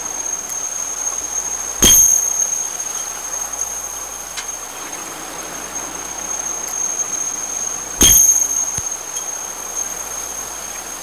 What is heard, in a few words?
BQS4 pinging, Caiman's active sonar pinging; We must have been chasing something